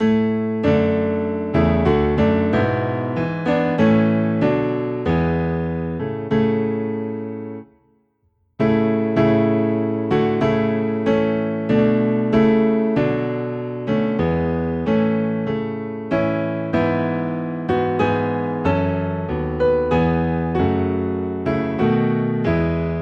We Gather Together, Piano Low in A

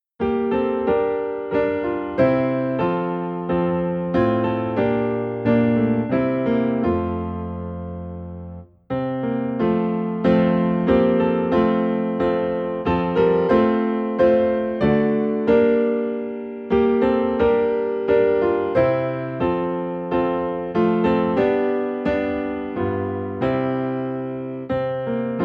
Piano in F

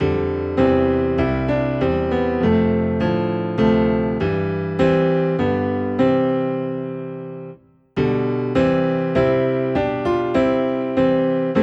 Piano Low in C; The Master has Come

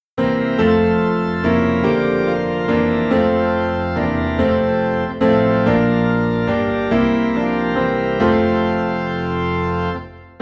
Piano and Organ Low in F; It Came Upon a Midnight Clear; christmas

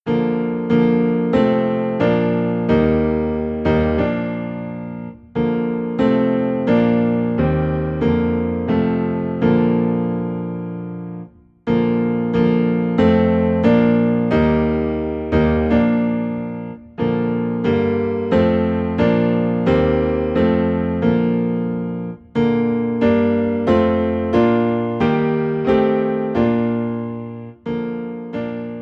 Piano Low in B Flat, hymns; Hi Eb Lo Bb; We Believe In One True God

